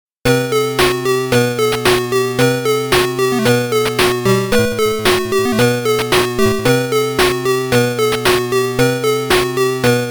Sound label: Boss Battle (SMS Version)